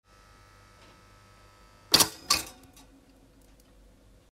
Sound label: toaster